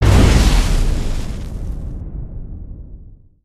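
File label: Fire Tornado Mid Blast; Spinjitsu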